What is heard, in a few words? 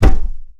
Thud